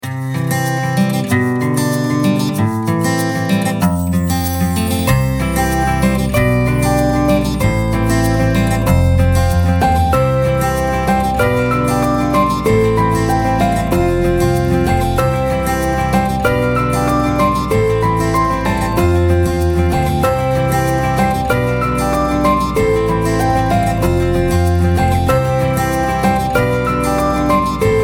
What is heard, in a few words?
airy acoustic melody